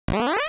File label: jump up